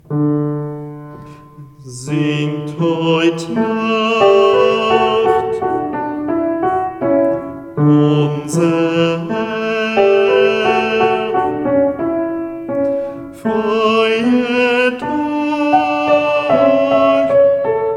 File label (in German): Bass; Sternen Carol; adventsmusik